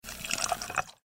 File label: pour soda